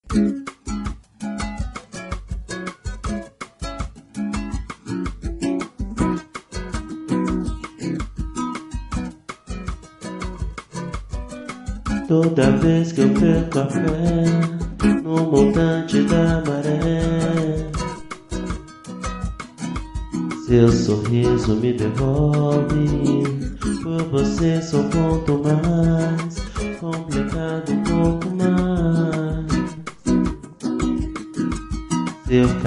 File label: samba rock